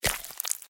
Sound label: candy bite; Adventure Time - Elemental; ThrillGames